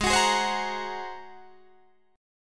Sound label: G sharp 9th, Guitar, Open D